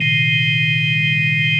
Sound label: ORGAN, Drum & Bass, 160 BPM; Ueberschall; Drum & Bass Flash Trackz